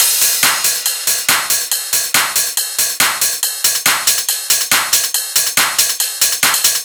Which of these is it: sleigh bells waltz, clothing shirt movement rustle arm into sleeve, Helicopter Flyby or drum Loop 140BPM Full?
drum Loop 140BPM Full